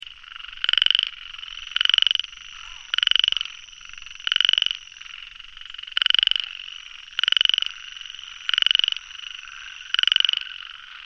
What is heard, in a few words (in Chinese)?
黑蒙西, frog